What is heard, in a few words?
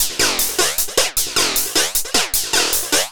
Hi-Hat Loop, Drums, Dance Beats